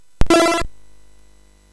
MOS6581R4, GAMES; Solitaire

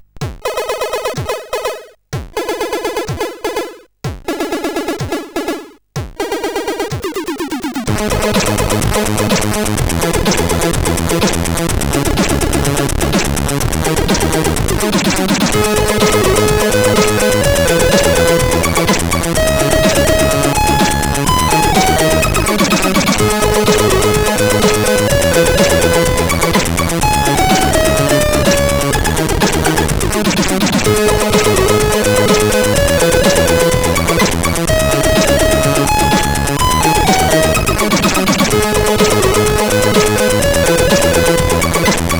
sid CSG8580

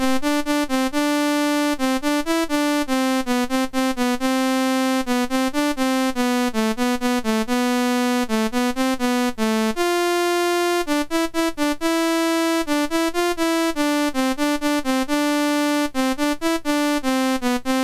Computer Lullaby, MOS6581R3

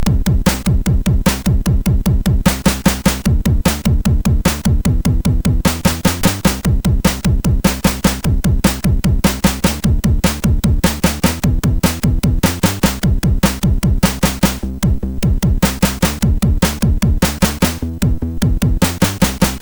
Live, MOS6581R4

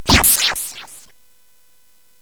SFX; Narco Police; hvsc

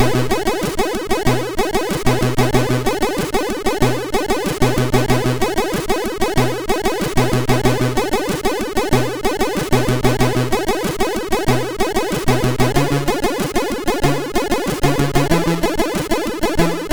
Relaxation, MOS6581R2

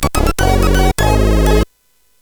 Ingame Jingle, CSG8580R5

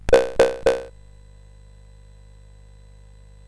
CSG8580R5, GAMES; Street Rod

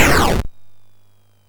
Frantic, MOS6581R4

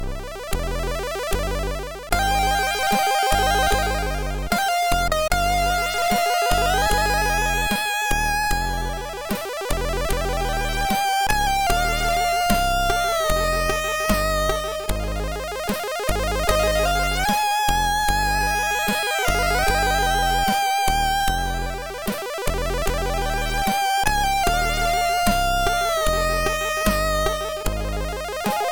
sid MOS6581R2; True Delight

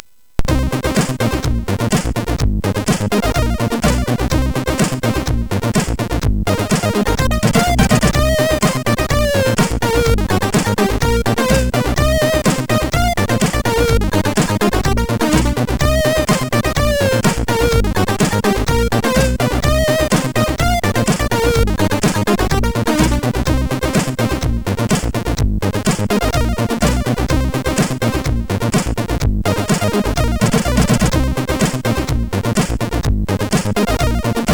Disco Remix, MOS6581; Disco Zax Remix; MOS6581R4

